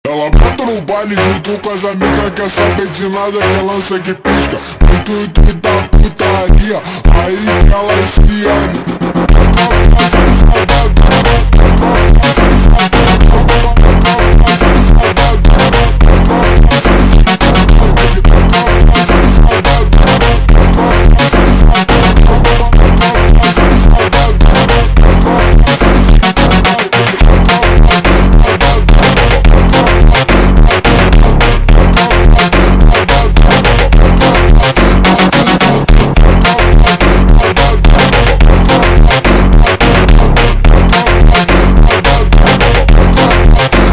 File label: Montagem ultra Slowed